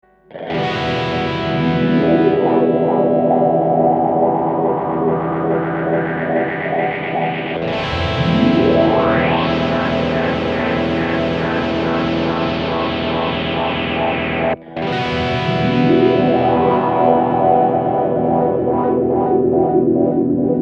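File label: hologram electronics microcosm guitar tunnel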